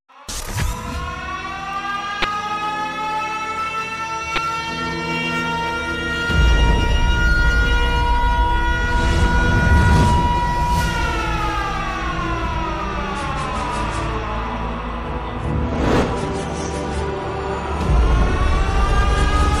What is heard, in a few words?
thrash death